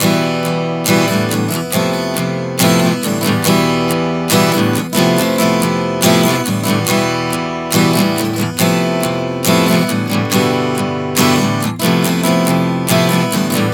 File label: Progression 070 Em-F-Em-F-G-F, Acoustic Guitar, Chord Progressions 070 bpm; Sonic Foundry; Songwriter Acoustic Guitar Companion